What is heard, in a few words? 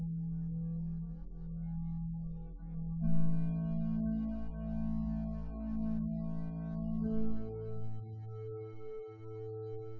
wdrums synthconv 250ms 1m; SiSEC08; SiSEC underdetermined